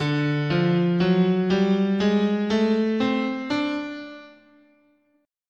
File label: D Mixo Blues, guitar, scale